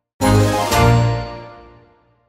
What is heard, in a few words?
win; TurnBox